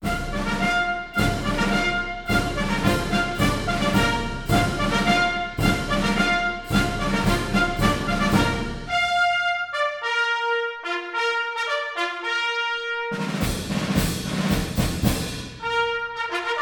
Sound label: adjutants call into french foreign legion march; Marine Corps Birthday Ball